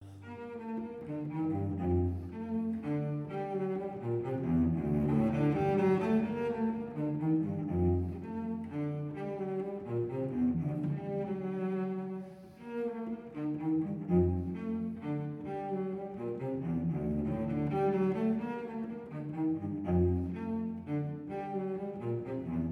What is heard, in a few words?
Cello Suite No 1 in G Major Menuet II